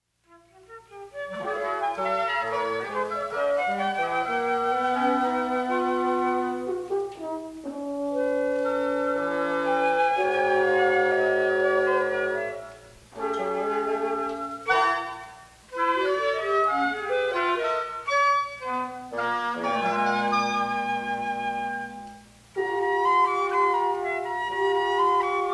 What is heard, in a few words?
Wind Quintet; Seyfrit